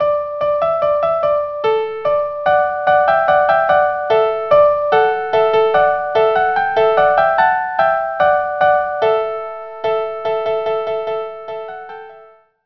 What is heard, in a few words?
Menuets and Tambourin; Corette